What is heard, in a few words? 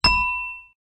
bell puzzle